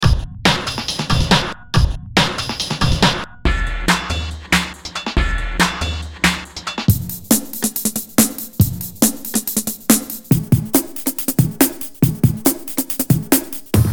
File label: Beats; Apoxode Beats 01-08